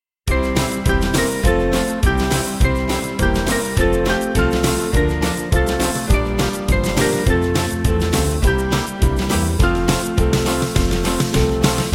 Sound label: Band; Small Band; Glory Honour Praise; Madan